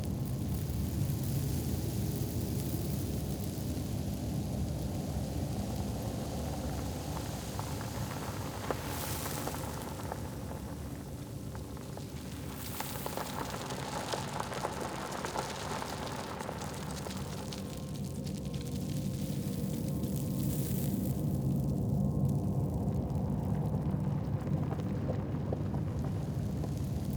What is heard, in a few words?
Crypt Bed Small; Champion Crypt